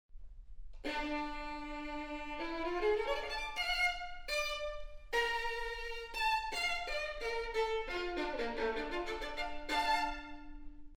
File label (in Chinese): siqin; 斯特拉迪瓦里琴与新琴音质差距多大听听分辨一下